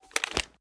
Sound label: reload empty drum end; reloads; gmod